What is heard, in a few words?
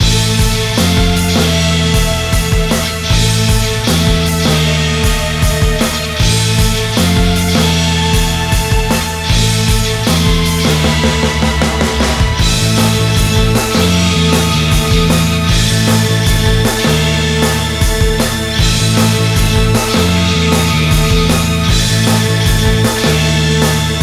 BGM Occasion